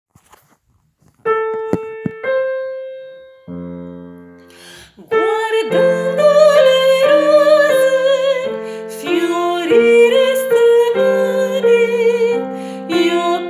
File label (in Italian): soprani intro parte bassa